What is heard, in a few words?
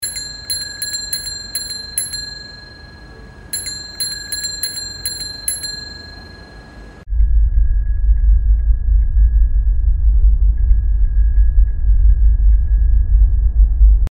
Sounds of Mars bicycle Earth Mars; exploring space perseverance mission mars; hear bell identify planet